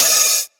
verby open hi hat one shot a key, Royality free open hi hat one shot tuned to the A note